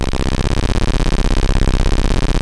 OSCAR C1, Synthesizer; Hollywood Edge - Giorgio Moroder Rare Synthesizer Collection

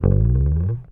HOFNER, Bass

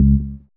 BAS, Dance Bass

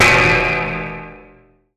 BUZ; Masterbits - Total Zone

